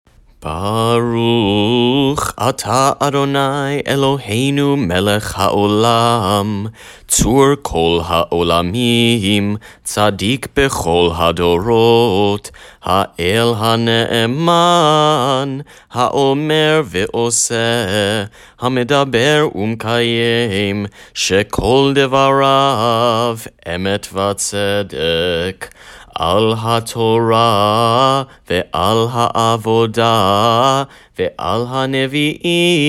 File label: Haftarah Blessing After Chanted